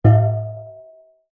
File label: industrial metal large container down on ground